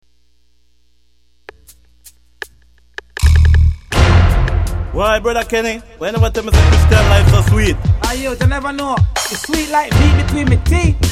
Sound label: christian life rap version